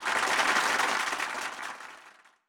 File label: Crowd